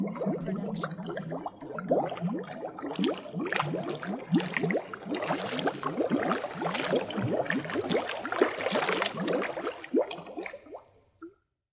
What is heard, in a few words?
surface bubbles; soundscrate